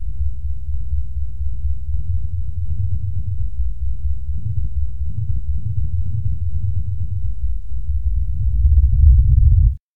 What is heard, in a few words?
breath noise bass